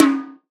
Perc; Uppercussion Conundrums

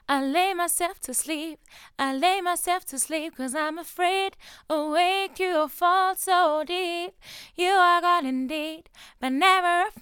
vocals; mxl v69m

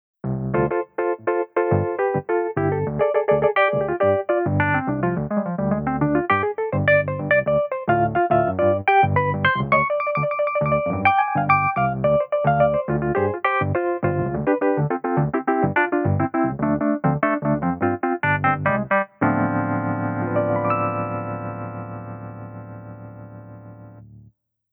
e. piano

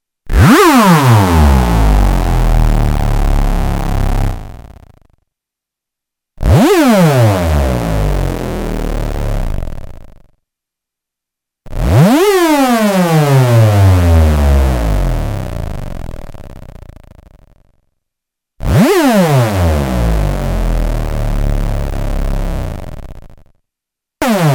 behringer model d hoover; Kompakter Desktop Synthesizer

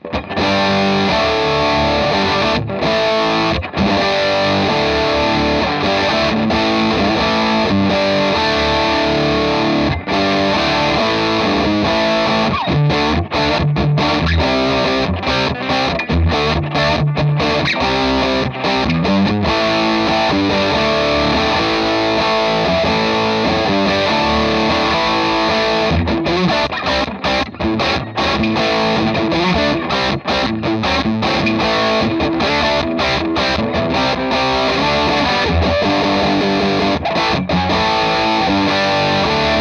celestion g12m highgain; laroqua 212 studio test

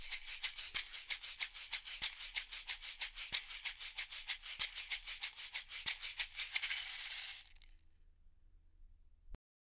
shaker

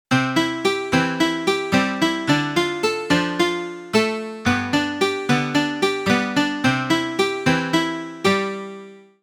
Casio SA 80; 90 Indian

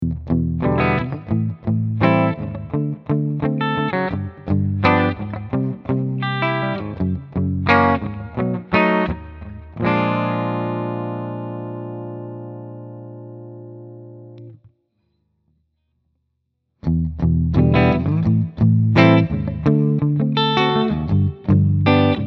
harley benton hb 35plus clean

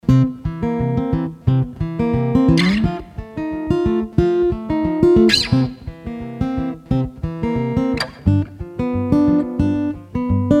shadow jm-30e ns picking; shadow jm-30e ns test

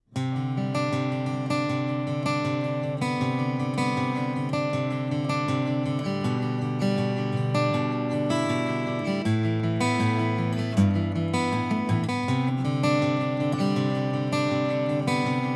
picked; baton rouge om cab

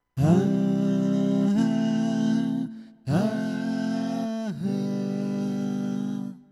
yamaha tyros 5 vocal harmony vocal harmony

